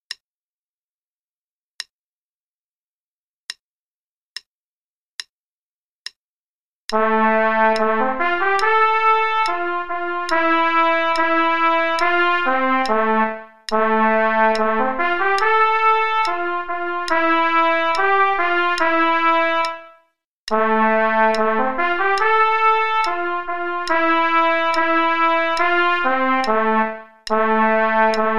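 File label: Play-a-long, quarter note = 72, trumpet; Rubank p.48 Der Freischutz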